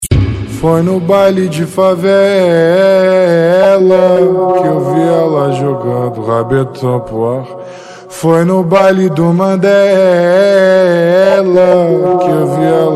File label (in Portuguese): eletro funk